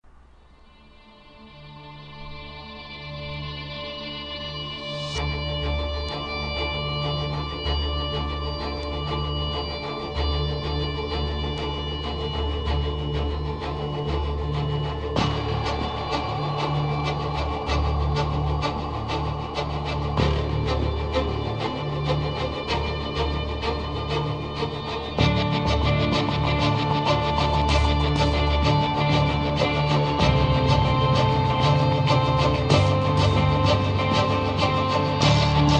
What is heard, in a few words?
chase action strings